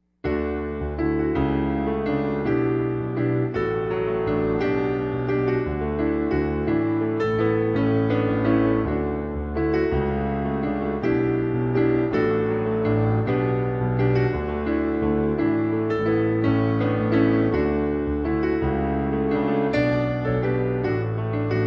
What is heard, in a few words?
Descant; St. Anthony of Padua - Choir Sunday